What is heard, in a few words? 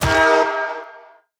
Toy Hammer Hit; Weapons